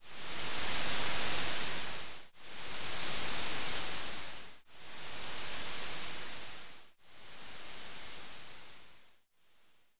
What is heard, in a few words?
sandwave